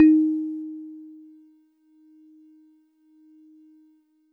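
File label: Warble Celeste DX100 D#0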